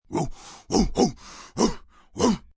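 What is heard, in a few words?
Dog Deep, Dog barking, big dog (human voice); Animal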